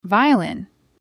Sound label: violin